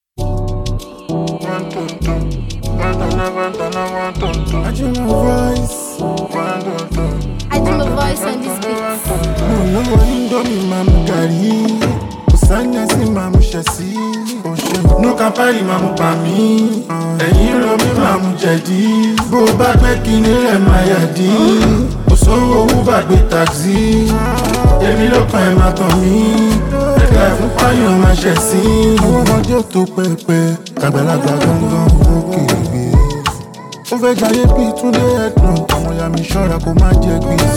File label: Drums